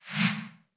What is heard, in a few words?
menu back; Osu!